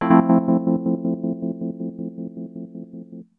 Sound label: wurl del, dx7